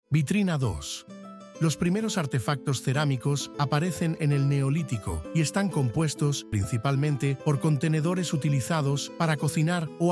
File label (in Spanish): audioguia música